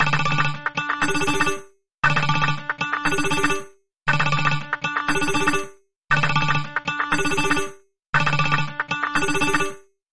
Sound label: bollywood ringtone